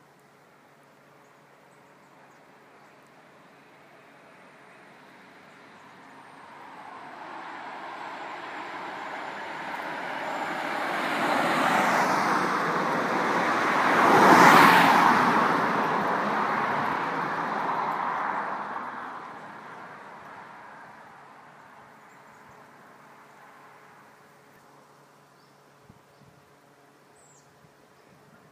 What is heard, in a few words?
Mountains Road